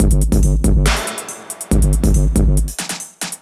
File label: Beat - Full, Grime, 140bpm; Zero-G Sound Sense Grime Scene; GS Construction Kits; Just Flex